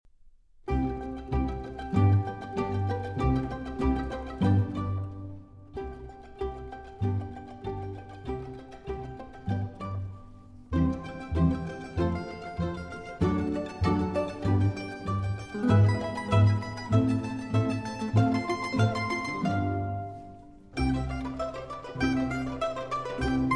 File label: Mandolin Concerto in C Major, Allegro